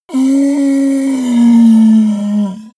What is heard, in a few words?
yeti groan pain cry